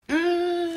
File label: gasp; Uncle Albert